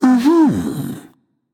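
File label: non-verbal agree; smite; voicepack; jingwei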